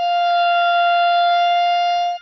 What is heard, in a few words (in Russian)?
gubnaya garmonika nota re d